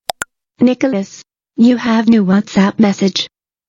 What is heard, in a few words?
Whatsapp message